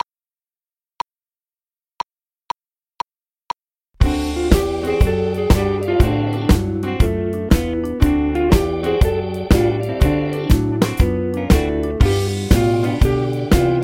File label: GTR Drums